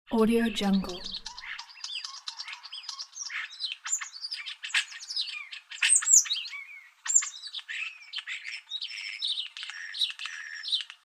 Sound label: starling singing